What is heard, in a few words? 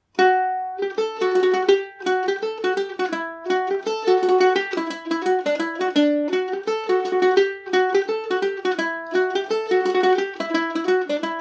dmaj, mandolin; The Pagoda; G&O #34 mandolin